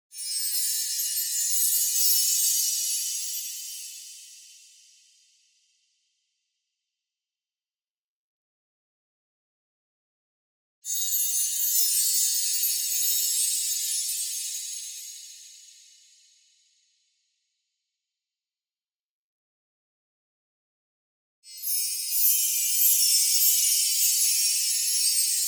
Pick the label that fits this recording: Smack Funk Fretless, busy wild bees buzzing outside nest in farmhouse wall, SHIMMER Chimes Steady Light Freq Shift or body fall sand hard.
SHIMMER Chimes Steady Light Freq Shift